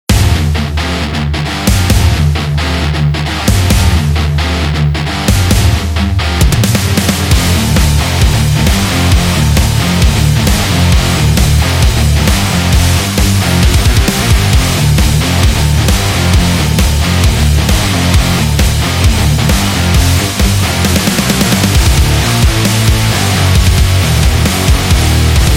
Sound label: Heavy Rock